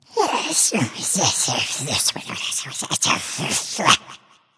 grumble; smite; voicepack; scylla

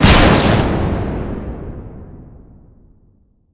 hit; smite; god raijin

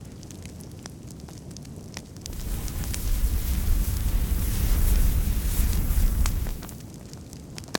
lobby victory fire; smite; pele

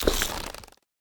defeat pillars move; smite; god ganesha skin11

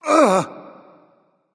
fear; Smite; baronsamedi

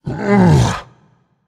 grunt lift; smite; kuzenbo

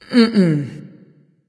nv disagree; smite; awilix

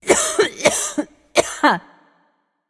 clearthroat; smite; voicepack; persephone

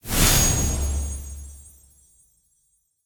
idle orb conjure small; smite; olorun

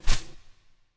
wing single; smite; khepri

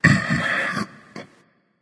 nv grunt lift; cernunnos

